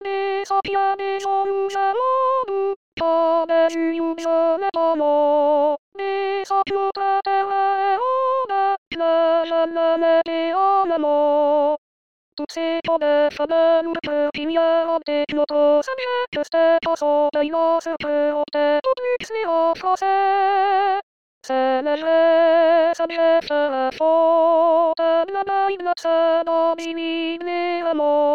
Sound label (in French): hymne national; saujet